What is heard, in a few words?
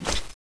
lance draw, weapons